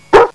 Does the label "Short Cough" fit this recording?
No